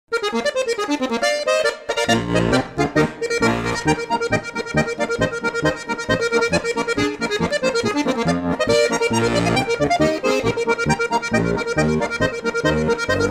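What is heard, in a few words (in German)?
Galopp, Harmonika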